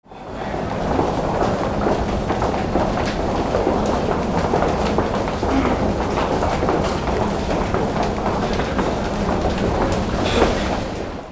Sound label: AMBIENCE